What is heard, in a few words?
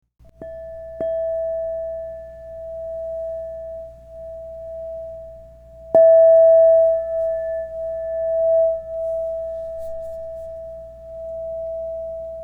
Crystal Tones Singing Bowls